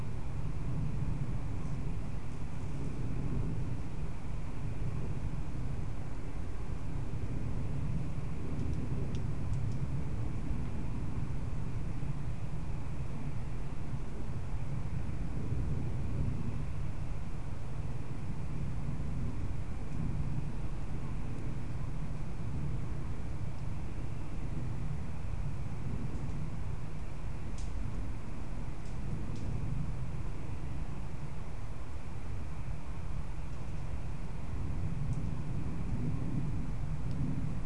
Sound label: no obvious biophony